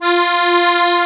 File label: HOHNER, akkordeon